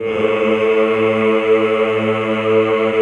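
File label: Choir A1, Ehh; Eeh + Ehh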